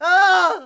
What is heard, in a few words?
plyr ht; quake; halo; hurt